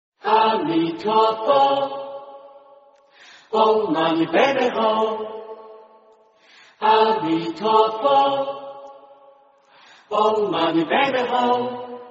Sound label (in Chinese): 佛光山之歌(清唱版); 星云大师